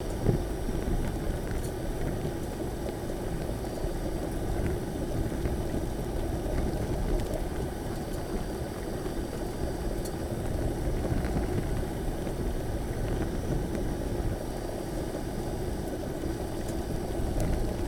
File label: boiler; factorio 2.0.55